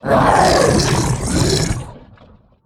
Fungal Bruiser Death

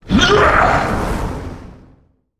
Attack

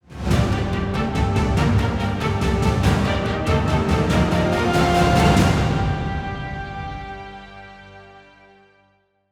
Stinger; Khadgar

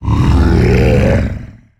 Enter Play

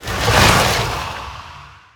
Portable Ice Wall Attack

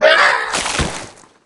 Flesh Eating Ghoul Death

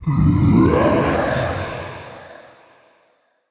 twilight elemental attack

